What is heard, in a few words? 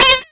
GRAT HARD, Ragga & Dub Reggae; USB Soundscan vol.27; AKAI